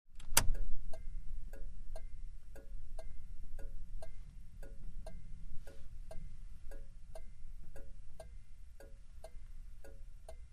Jeep Wagoneer: Blinker Switch On / Off, From Interior Perspective.